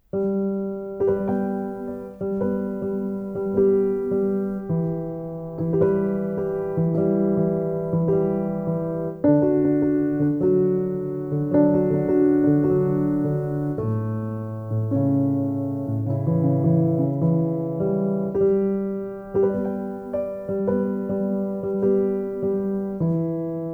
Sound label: Piano Preludes